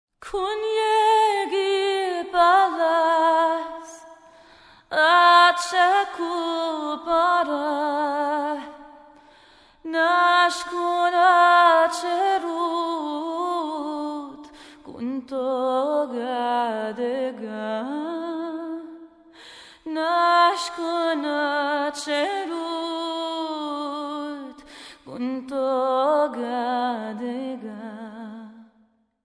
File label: Armenian Lullaby